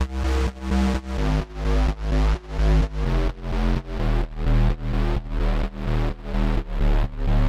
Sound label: sidechain bass